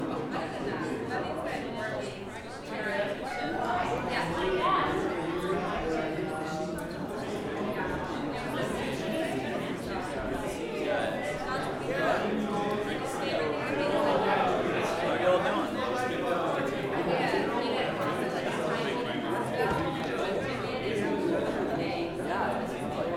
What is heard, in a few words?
Crowd At Wedding Reception Ambience